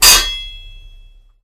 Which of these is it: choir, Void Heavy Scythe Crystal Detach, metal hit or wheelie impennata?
metal hit